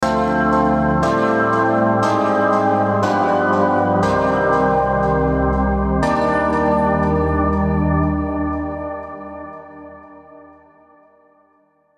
Minor Sixth Diminished Prog; Unison Minor Sixth Advanced Scales MIDI Collection

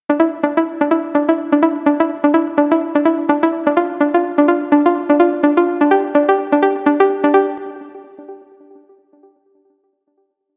Pluck; Mood; Unison Serum Starter Pack

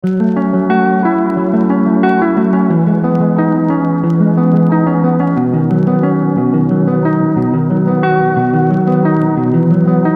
90bpm, Mixolydian; MIDI; Unison Mixolydian Advanced Scales MIDI Collection

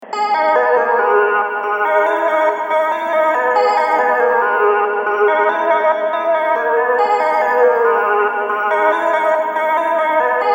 Melody, G#m, 140bpm; MIDI; Unison MIDI Starter Pack

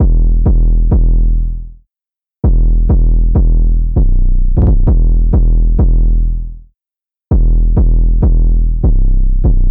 808 197 BPM Em; Unison 808 Pack

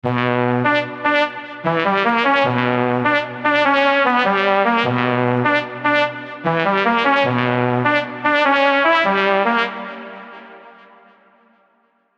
100bpm, Harmonic Minor; MIDI; Unison Harmonic Minor Advanced Scales MIDI Collection

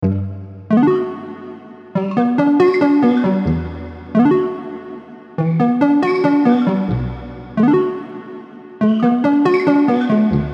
Pluck; Blaze; Unison Ice'd Out Collection for Omnisphere

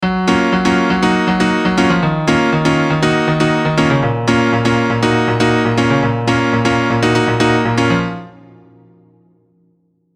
Keys, Mainstage, Serum; Unison Mainstage Collection for Serum

